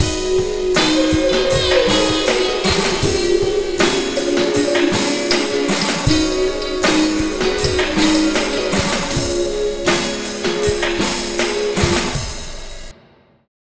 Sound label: Slo Mo Full-G#, Slo Mo Funk Mixes, Lounge; Zero-G Kocktail Kollection; Construction Kitz (Lounge)